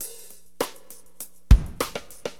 Drums, Acid Jazz Funk; LiveAct Pool

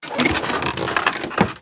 chest; Ambient; Zeus

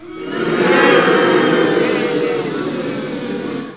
crowd boo

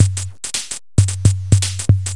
D-Kit

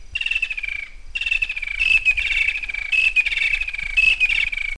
birds; onsafari

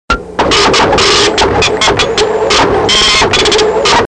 PRINTER; miscellaneous sounds

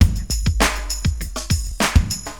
Drums, AcidJazz Funk; hiphop; LiveAct Pool 1

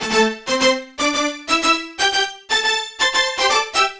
Strings Lines; Cyclick Seduction; Kit C

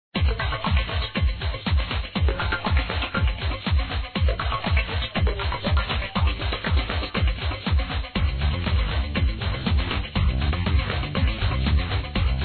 extending, music; MicrosoftXP

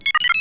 Computer Sound Up; The Official Guide to Babylon 5

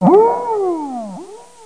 howl

